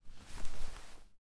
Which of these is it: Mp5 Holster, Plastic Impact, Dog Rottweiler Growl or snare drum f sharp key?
Mp5 Holster